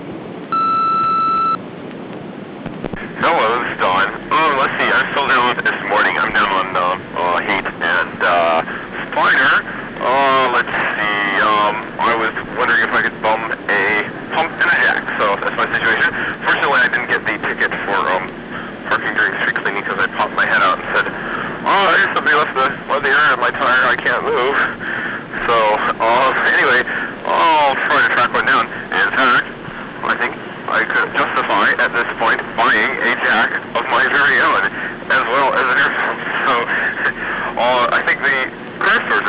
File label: slashed tire